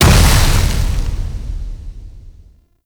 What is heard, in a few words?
Stadium Hazard Pyro Start; Gameplay; Warframe; Nineteen Ninety Nine